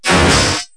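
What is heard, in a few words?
vaporizer, cartoon space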